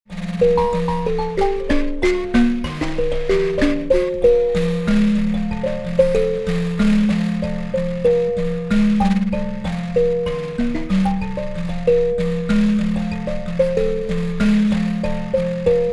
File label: mbira